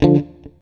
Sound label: STRAT, GUITARS; Synclavier Sampler Library